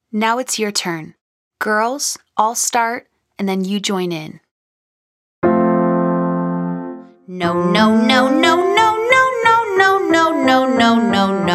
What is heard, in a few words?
No No No Long Scale Female